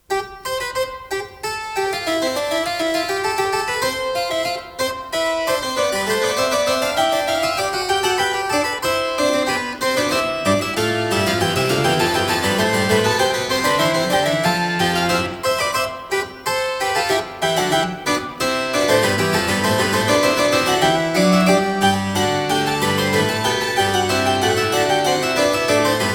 Harpsichord Sonata in D Major

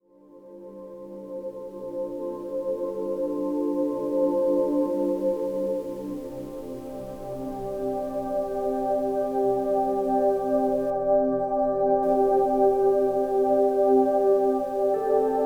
Carefree Soundscape for Coffee Houses; Ginza Tokyo

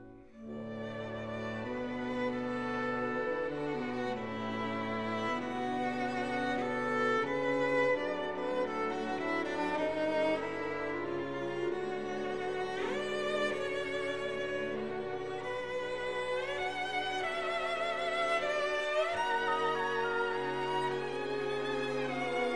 Cello; Raphael Wallfisch; Cello Concerto

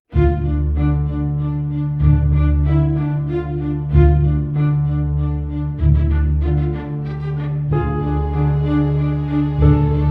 Konzert in G-Dur, Organ

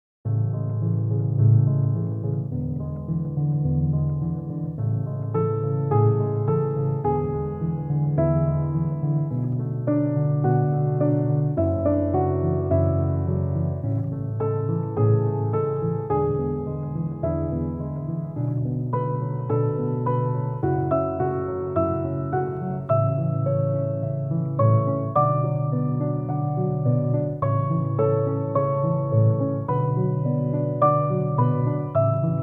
Bar Lounge, Relaxar Piano

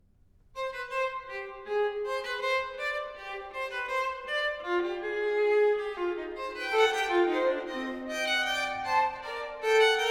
String Quartet, Fuga in D Major; Das wohltemperierte Klavier

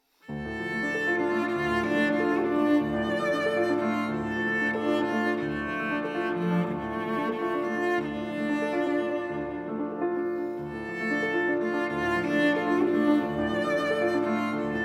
Prelude for Cello and Piano; 24 Preludes; Op. 178

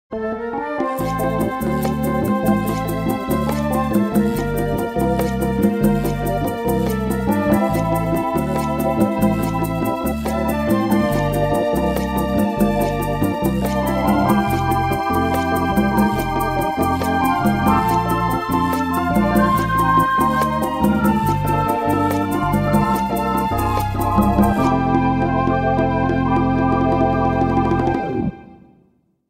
Bossa Nova Latin Jazz Piano

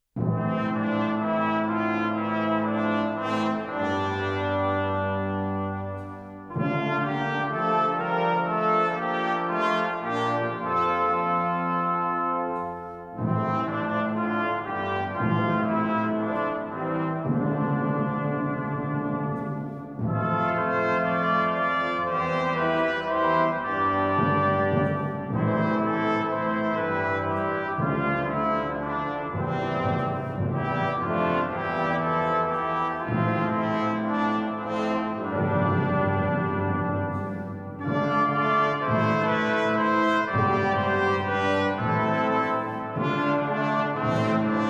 Fanfares from Horn, Tempo di polacca; Sloupnice

